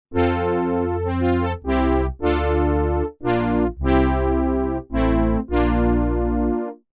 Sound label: Matrix 1000 Brass